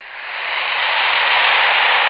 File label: long rev noise; Weird and Interesting Hits; single hits